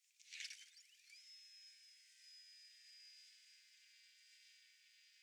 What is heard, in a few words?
DRONES